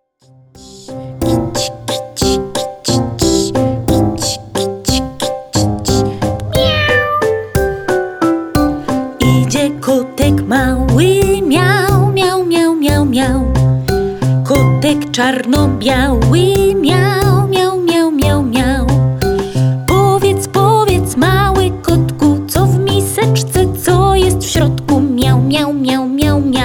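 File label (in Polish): mały kotek wokal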